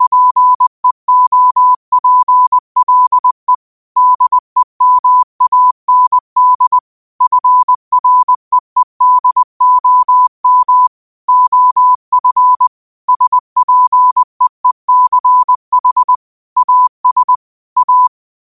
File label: 5 wpm, morse